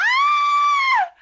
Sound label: woman scream